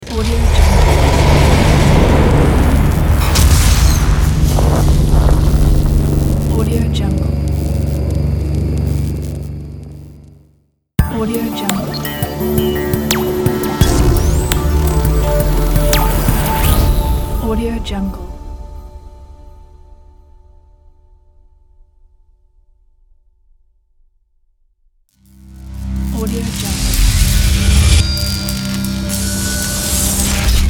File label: energy tech logo pack; ztarrzound